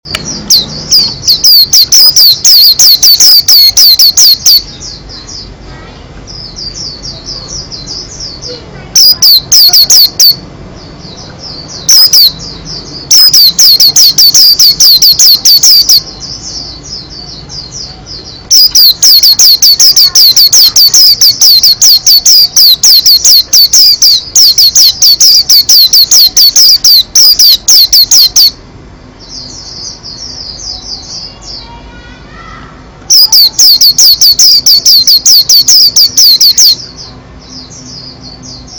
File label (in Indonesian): pleci kacamata gacor; masteran